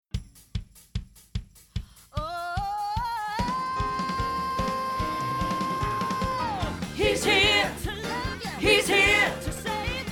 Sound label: Go Tell It Reprise Alto; Christmas 2015